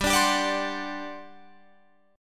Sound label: G Major 7th Suspended 4th, G, down, Guitar, Drop D